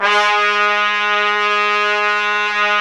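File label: BRS TPTS, Trumpets Section f; Roland LCDP06 Brass Sections; Tpts Sec.4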